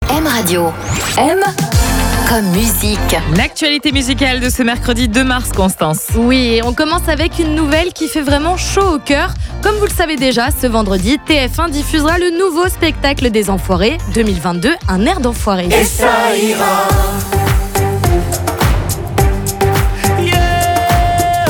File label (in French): orchestre; m comme musique